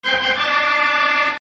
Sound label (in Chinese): 风琴上行; 战中; 战斗音效; nba